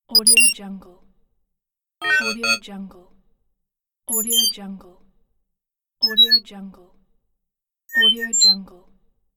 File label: scifi computing